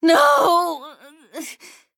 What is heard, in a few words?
Death